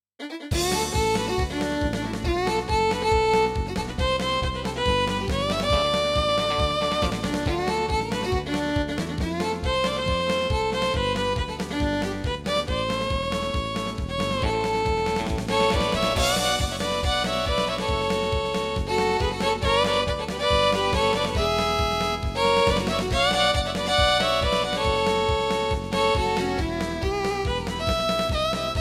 SC880

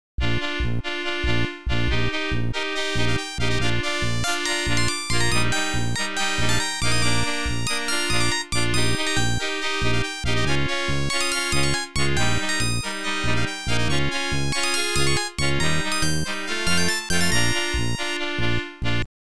OPL3

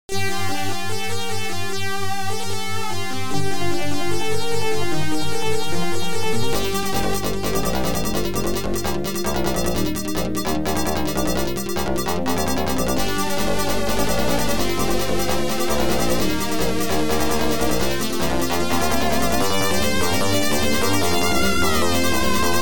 OPL2; Tyrian

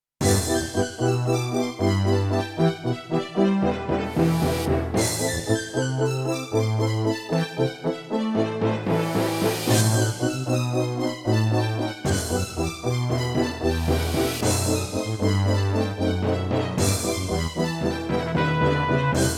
CM500 GS; LSL3

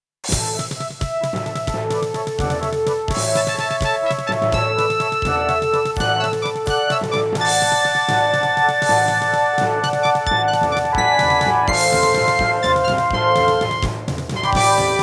CM500 GS

